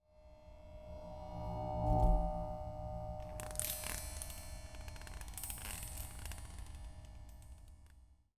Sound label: Feedback Chime; Percussion FX